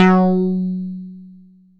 MULTIBASS, Dance Mega Jungle Rave; 90 s Sample CDs; Best Service

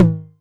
Tom Hi, Novation Drumstation 909

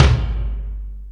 LOW TOM; E-MU Formula 4000 Series Vol. 2; Dance Set 2

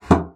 bassoon slap; Xsample VOL-6; C BASSOON

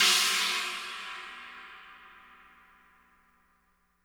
china cymbal

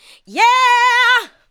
ADLIBS

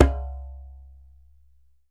DJEMBE; E-MU Producer Series Vol. 7 – Old World Instruments; Drums&Percussion

